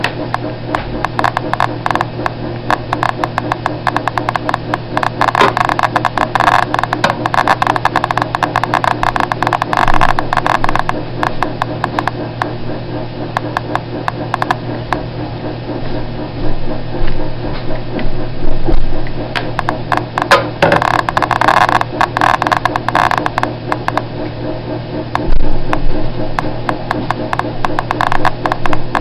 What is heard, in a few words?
Geiger counter